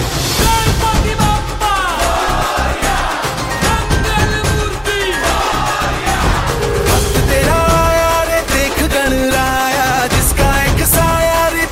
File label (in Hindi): Ganpati Bappa Morya Mangal Murti Morya Danka Baja Song